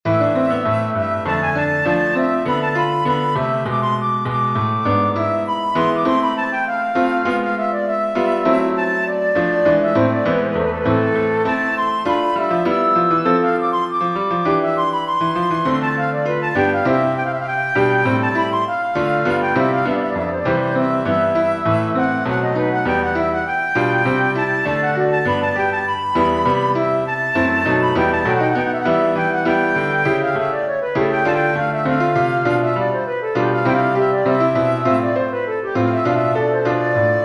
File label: allegro from the sonata minor for flute piano